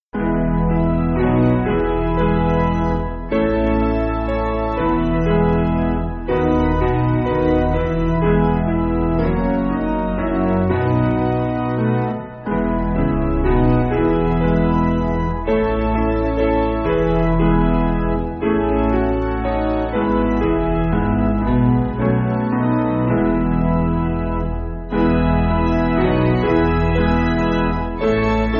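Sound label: Piano, Backing Files for Churches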